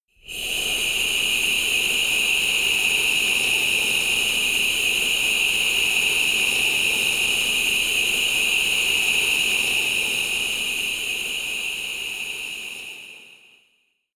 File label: air hiss scream, horror